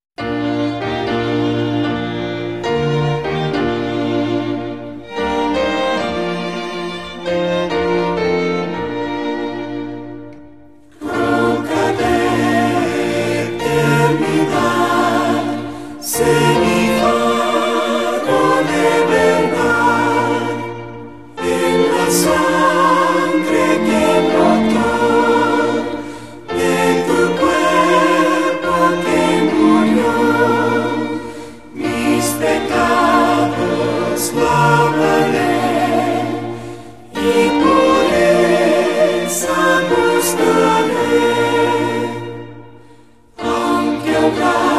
vocal and instrumental, hymns spanish

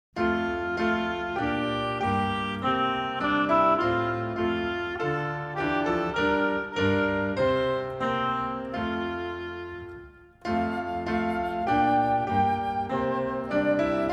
men instrumental, hymn; the priesthood of our lord

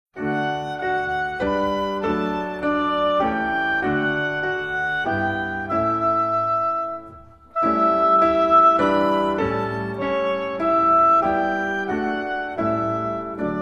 instrumental, hymns; hymns spanish

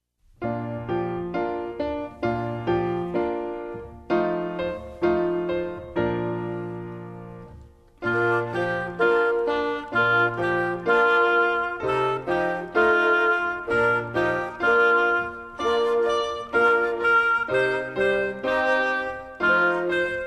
music only; childrens songbook; Chinese

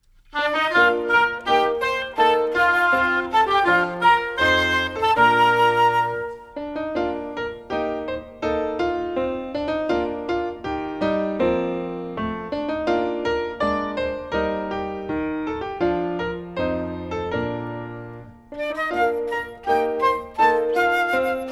have a very happy birthday music only; childrens songbook

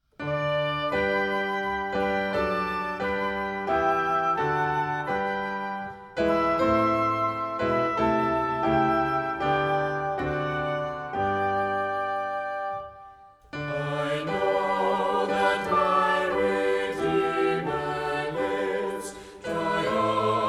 vocal and instrumental, hymns

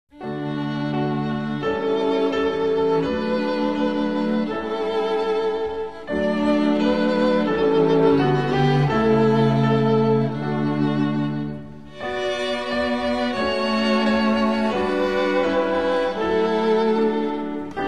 vocal and instrumental, hymns spanish

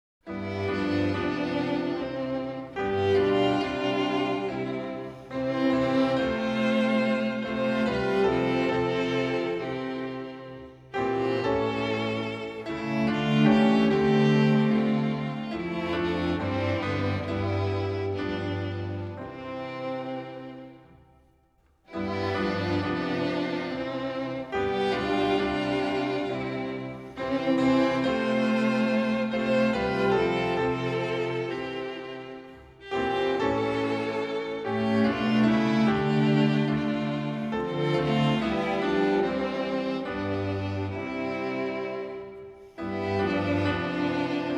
be thou humble instrumental, hymns; accompaniment

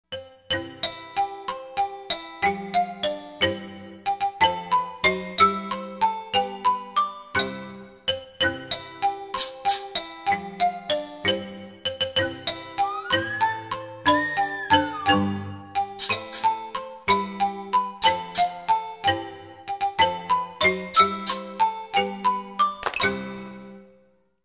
music only; childrens songbook; french

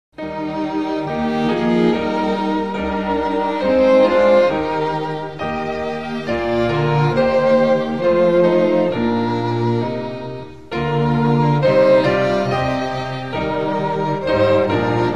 instrumental; hymns spanish

